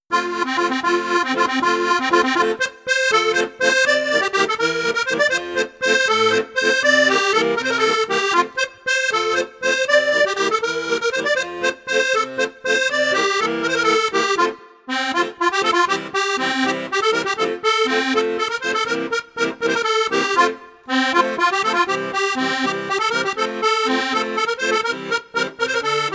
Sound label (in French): accordeon; maugein festibal